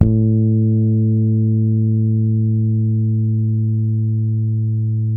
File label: HARMONIC A, PEDULLA BASS; Masterbits CLIMAX 7 - Bass